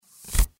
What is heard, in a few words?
cards deal flop, poker; poker game